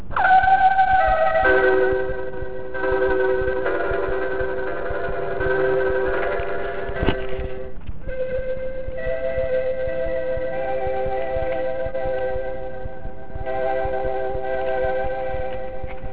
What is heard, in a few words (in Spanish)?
radio señal intervalo; radio loiola